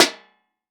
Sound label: Impact Sheet Metal Impact Crowbar Hit Trash Can Lid